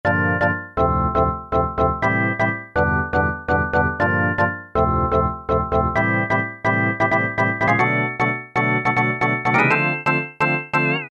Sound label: hit-Wazzer; E-mu Orbit V2; Factory presets